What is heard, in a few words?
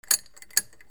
KITCHEN